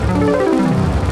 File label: Slot machine Roll; uno